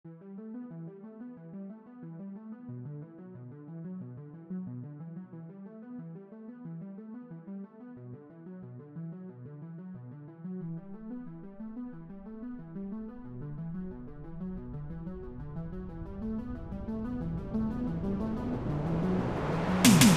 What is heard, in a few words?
Synthwave